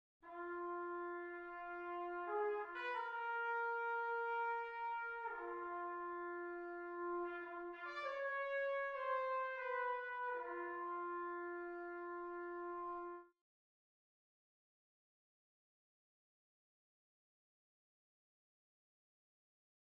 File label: Trumpet in Bb; OSS 117